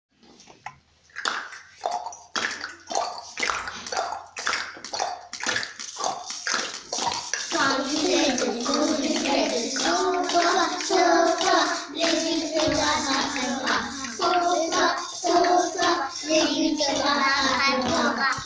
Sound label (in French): bruits de bouche et d'objets de la classe; pendulette souricette